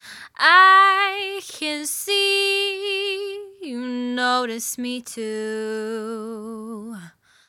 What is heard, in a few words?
VOCAL DRY